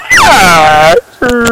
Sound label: noises